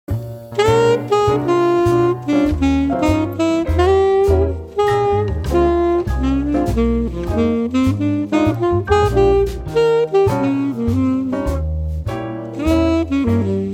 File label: Bb Blues, chorus